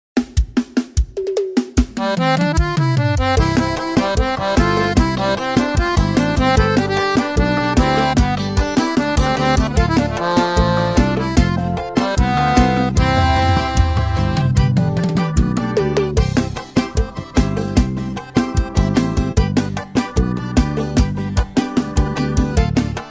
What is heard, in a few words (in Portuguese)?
Playback Versao Forro, sax alto; forro cristao sax alto eb